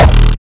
kick oneshot lilmonster E; GhostHack

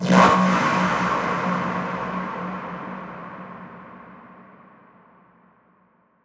Atonal Depths, Psytrance

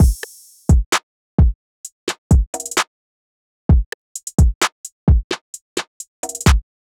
Full Drum Loop 130 BPM, Hip Hop; Cymatics; Cobra Hip Hop Sample Pack

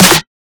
Snare, Dubstep Drum Shots

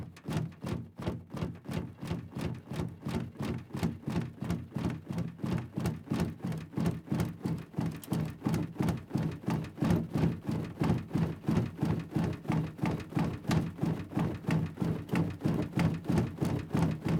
Car Banging; Fairlight Sound Lib

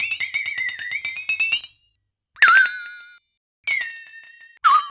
flex tone, Cartoon Film Effects

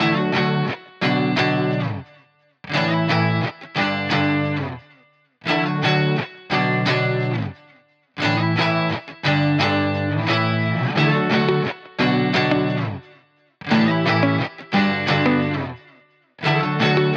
175 BPM D Maj, Guitar; Cymatics; Miami Guitar Pack - Pt 1

